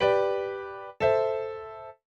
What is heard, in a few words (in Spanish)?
Cadencia rota